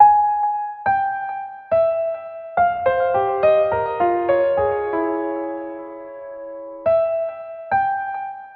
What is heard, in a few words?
piano, Loops Sad